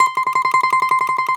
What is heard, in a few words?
DOMRA, Russian Strings